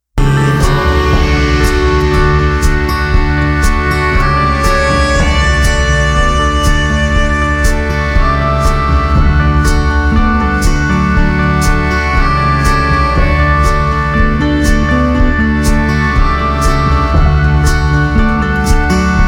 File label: String